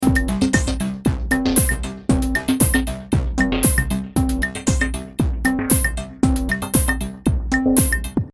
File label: Electro loop